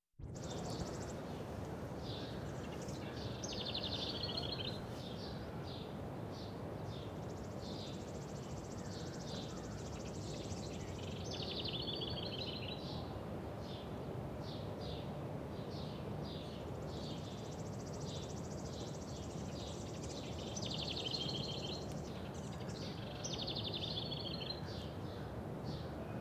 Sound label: Pines and Birds; Electa Quinney